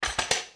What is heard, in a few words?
nail gun break; Zomboid; The Workshop